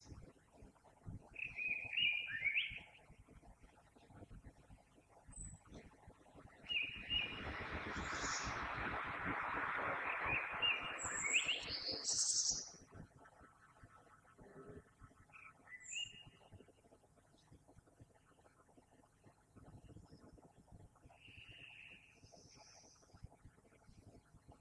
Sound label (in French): Merle